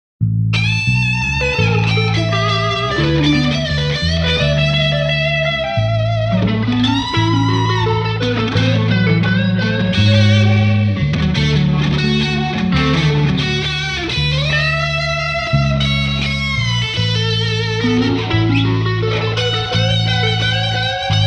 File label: Solo Git + Bass